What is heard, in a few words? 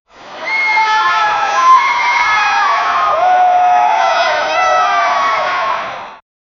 soldiers waking marching